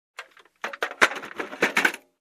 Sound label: Construction